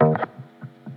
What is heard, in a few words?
textural, Guitar; Crowdsourced Sample Pack; TRUTH-HZ